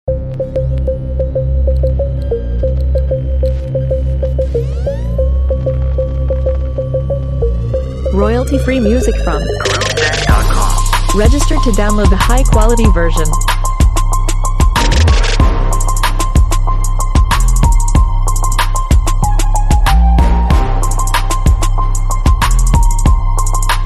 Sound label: Urban beats and trap rhythms come together to create a confident mood